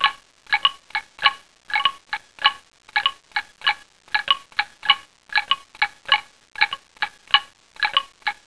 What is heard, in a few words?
anklung